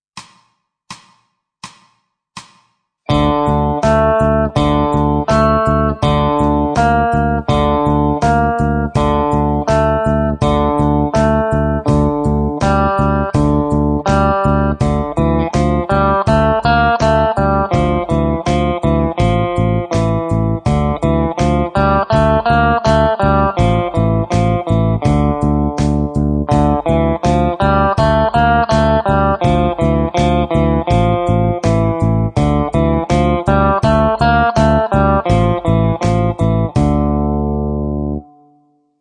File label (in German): E-Gitarre